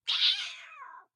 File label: hit; wilkins; Blind Drive